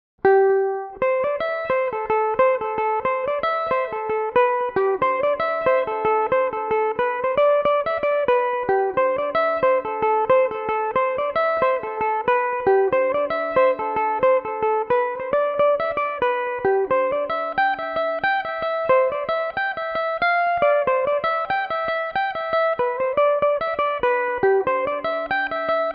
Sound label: piping plover cmaj, mandolin; Belmuse EM-190 electric mandolin; The Piping Plover